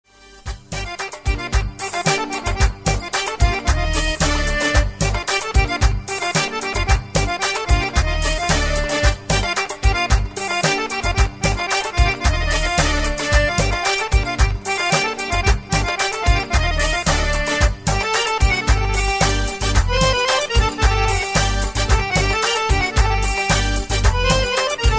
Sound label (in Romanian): Instrumentala arabeasca; Arabic